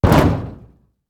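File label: object fall car hood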